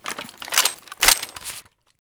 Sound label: toz66 misfire; bm16